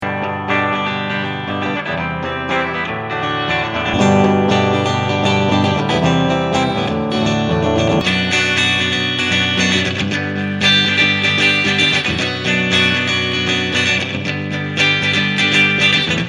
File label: clean gui; deathbyaudio